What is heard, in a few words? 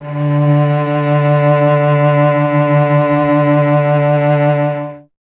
Cello D; Miroslav Vitous; Solo Instruments VOL-1